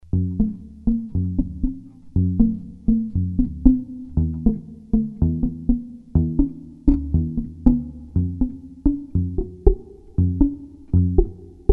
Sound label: mild steel